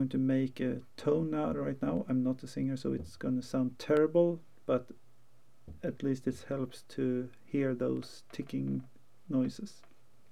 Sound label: tick noise, canon 5d mark ii audio; BeachTek DXA-5D; juicedLink CX231; Canon 5D Mark II Audio Exposed; with BeachTek DXA-5D and juicedLink CX231